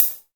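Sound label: hi-hat, drums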